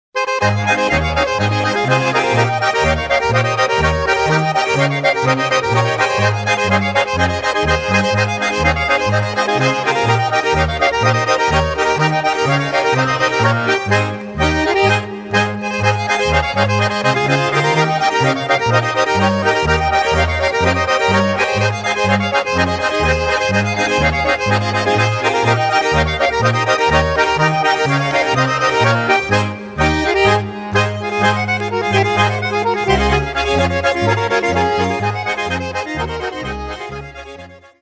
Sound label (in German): Steinbock Schottisch